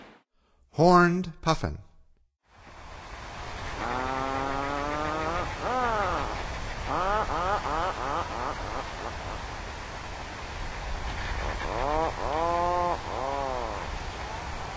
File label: Horned Puffin; North America; Stokes